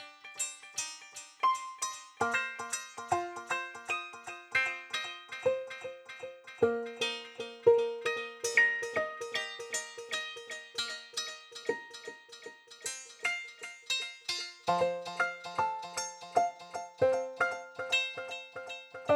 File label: melody, loops